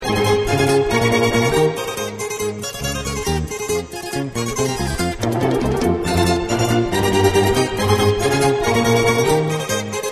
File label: Musical Joke